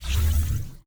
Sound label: ui archive confirm